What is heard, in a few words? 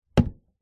foley wooden box case set down gently hard surface; zapsplat